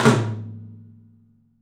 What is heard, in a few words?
OPEN TOM; 3D Sound; 3D Drums; 12 TOM